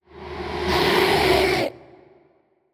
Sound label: Scream; Necro S Kell